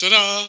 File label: TADA; tenpin